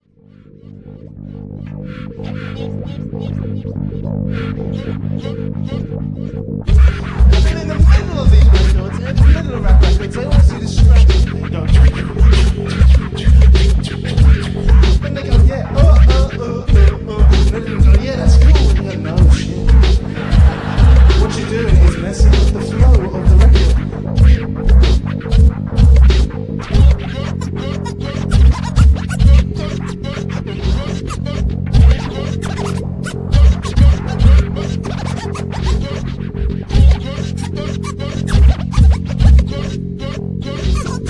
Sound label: truth scratch